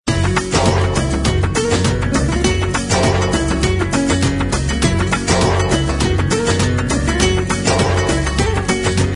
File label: electronic tune ringtone